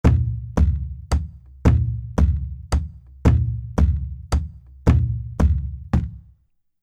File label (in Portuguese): Ritmo roda